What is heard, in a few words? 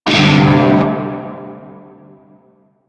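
Shawzin Pentatonic Major Chord B; Music; Warframe; Narmer Shawzin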